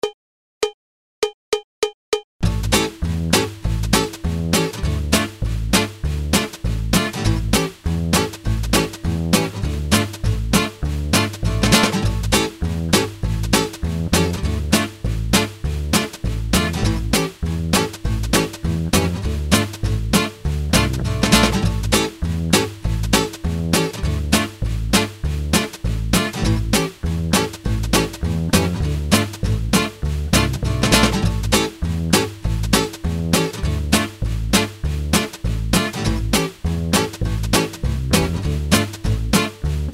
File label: 50 licks gypsy